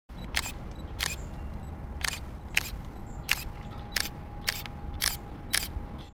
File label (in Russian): zatvor fotoapparata